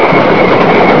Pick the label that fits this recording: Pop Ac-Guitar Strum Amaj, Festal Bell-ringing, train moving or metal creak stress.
train moving